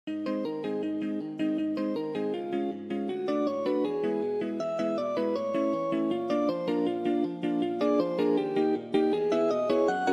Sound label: jingle bells ringtone; jingle bells 4; nokia ringtones